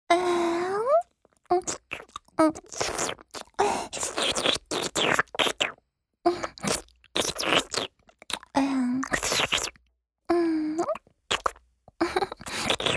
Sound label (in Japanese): お掃除フェラ(弱)：亀頭や陰茎に垂れた精液を舐める; 実用ボイス抜粋集